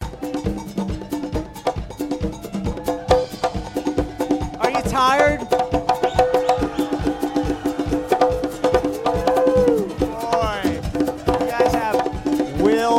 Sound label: Percussion Jam